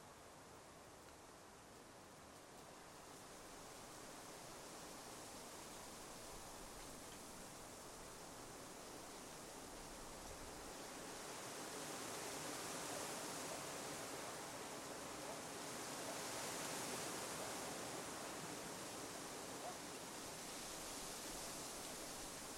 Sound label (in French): Vent dans le bouleau